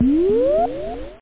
bubble; Loop Scream Tracker Sample

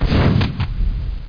exp fire, weapons; Quake; PainKeep

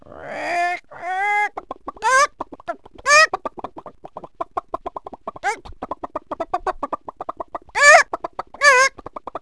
gobble; animals; Wired for Sound Pro CD; Aristosoft, Inc.

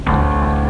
chord instrument; instruments various

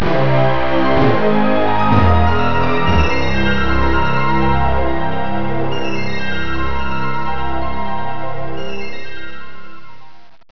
Big Intro; ClipMedia™ Demo

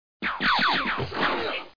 explo; PC Gamer IT CD 15; bgw demo

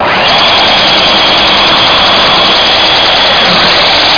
Sound beam

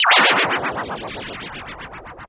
missile; robotron

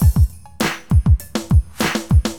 DM LOOP, Drums, AcidJazz Funk; LiveAct Pool 1

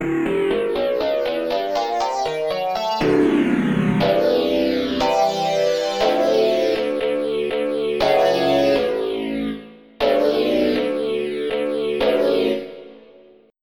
Flanged Synth

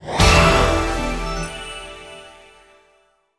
Level Up; Earth and Beyond; EA Games

